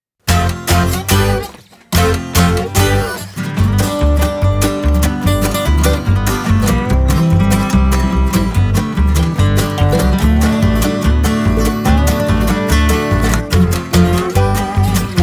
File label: Instrumental